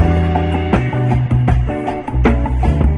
guitar; Loops; Phonky

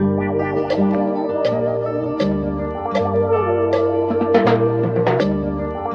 flute, Jazzmatazz loops

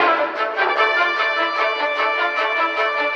horns, Loops

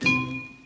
sad, Bells

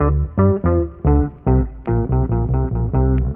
bass, Loops Phonky

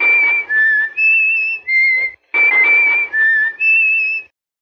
keys, Phonky loops

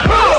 huh male, Voice; ooh ahh; heh huh

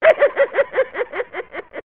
laugh cartoon; ooh ahh

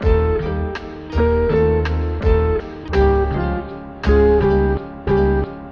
guitar, loops; Phonky

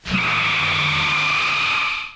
car screech

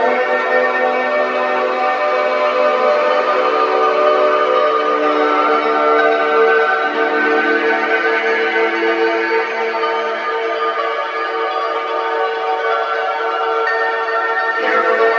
keys, phonky loops

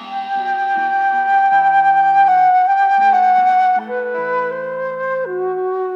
flute , Loops, Sad

